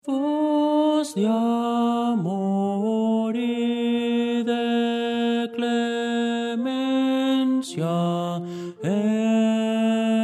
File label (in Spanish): tenor